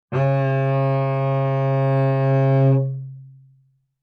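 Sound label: Cello arco fortissimo sul C C2; MIS Pitches - 2014; Strings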